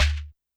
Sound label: Shekere, YAMAHA RM1X